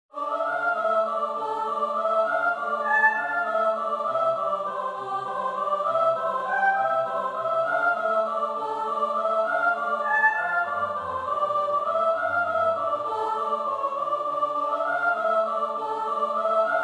No. 69 Tutti Finale Thus I stand like the Turk Sop